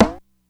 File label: Perc, techno drum